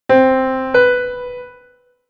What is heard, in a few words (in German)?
Intervalle sukzessiv